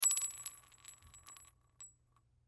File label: Bullet Shell; Bullet Shell 7mm Drop Cement Roll; Drops (Sound Effects:Objects); Blastwave; Warfare